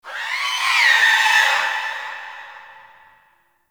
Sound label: Sentient Lair Exterior Creature; Ambience; Misc Creatures; Warframe